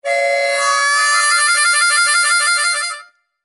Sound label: Harmonica Alert